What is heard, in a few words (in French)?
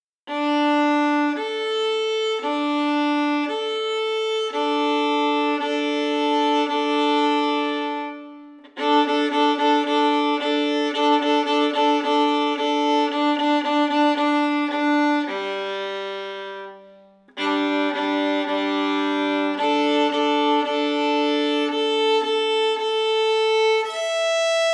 Improvisation avec doubles cordes Violon